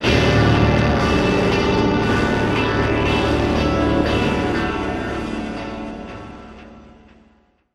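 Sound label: DISTORTED BASS GUITAR MELODY; gamerip; TRYPSTATION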